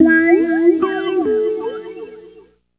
Fretting Petting Emin, Guitars; Drum & Bass; Total Drum&Bass

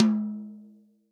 TOM, Real Drum Kit, POP KIT

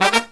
Killer Brass Riffs

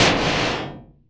METAL HIT, Anime Sound FX; AKAI Anime Sound FX

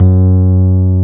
piano Rhodes

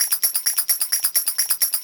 130 addon, DRUMLOOPS, HIHAT

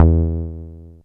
SOFT E2; Best Service Alpha Dance vol.2; SOFT SEQ.2

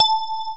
SYNTHESIZER DIGI DECAY; AKAI S6000 CD-ROM - Volume 1

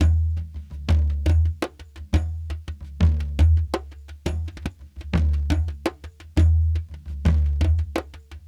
brekete, large darbuka

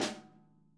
SNARE, Interactive Drum Kits

